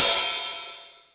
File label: Open hiht